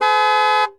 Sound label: 98 Vette Horn, Automobiles